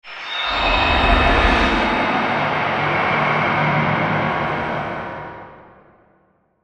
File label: Solo Attack; Se Solo Attack 02